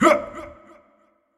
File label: Effort Short; Marv